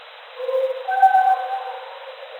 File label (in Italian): Vocalizzo; Forte Belvedere